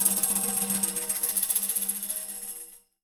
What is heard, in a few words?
HEADED, TAMBOURINES; Voice Crystal - Steve Reid Definitive Percussion